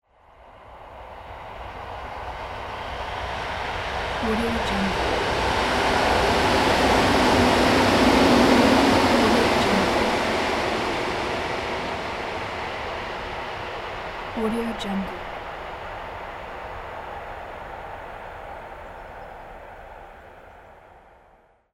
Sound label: train passing